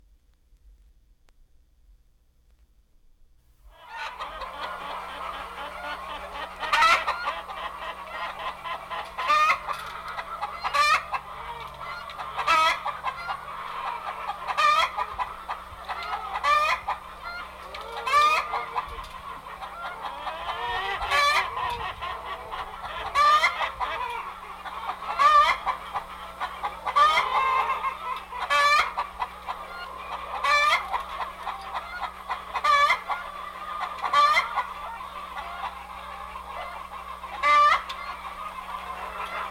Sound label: Chicken Coop; Jac Holzman; Authentic Sound Effects Volume 2